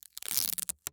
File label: Industry Tape Pull Off Roll Painters